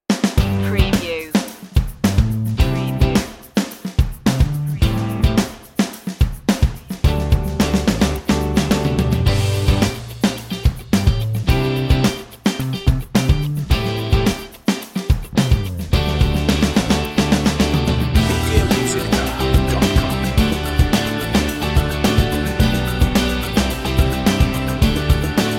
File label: piano groovin underscore